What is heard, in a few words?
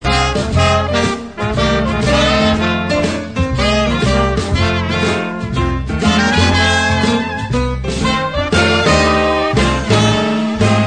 Jungle Blues